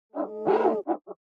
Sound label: Cry; Owlhe